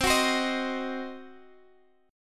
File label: C Diminished, Guitar Drop D, chord